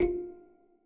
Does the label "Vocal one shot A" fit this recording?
No